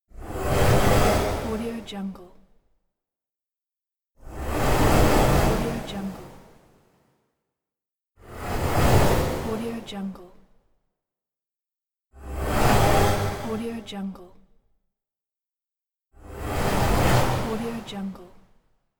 unguided starship flybys; lokohighman

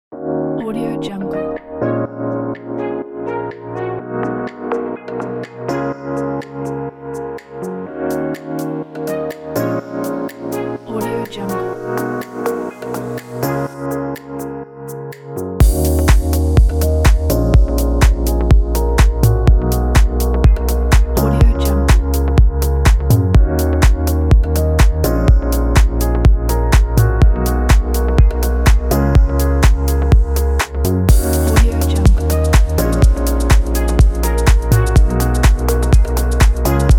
deep house summer

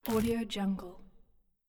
old sailboat hemp rope slide

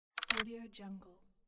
button click

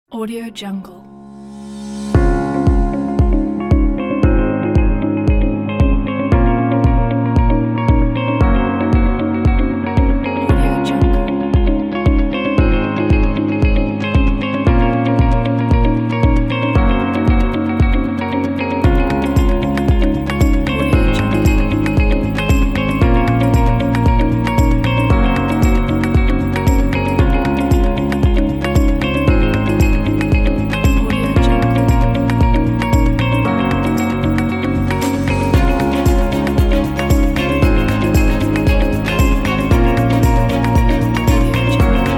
inspiring corporate upbeat uplifting

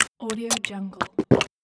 tasting food

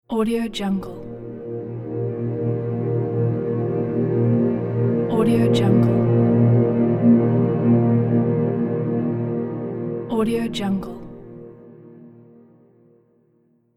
fantasy world rpg transition

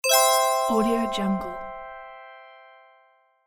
level up; medartimus

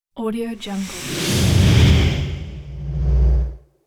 heavy double power whoosh

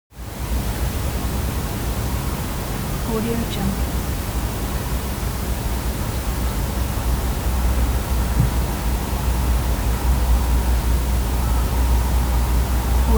atmo room tone bedroom small; smartsoundfx

